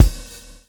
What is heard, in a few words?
SWNG